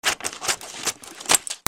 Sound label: OFFICE